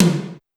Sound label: Rock Tom Hi, Pitched Up; Clavia DDrum-AT